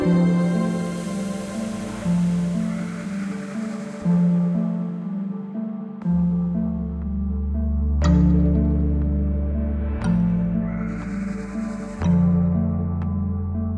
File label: Music middle